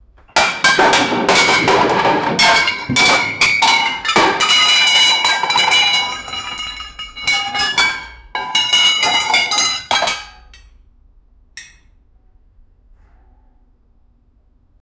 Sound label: kitchen bowls and plates; fire